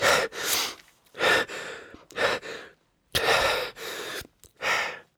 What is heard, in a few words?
Done Breath